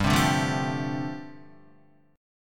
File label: G 7th Suspended 2nd Suspended 4th G, Guitar; G7sus2sus4 strummed